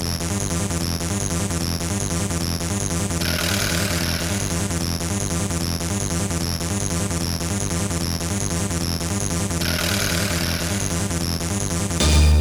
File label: chunky breakbeat; Trackers; Modland; Pye